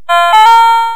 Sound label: startup; Compy386